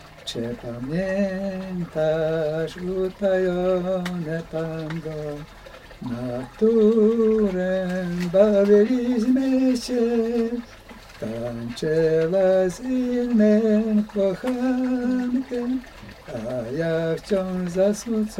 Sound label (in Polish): Utajone tango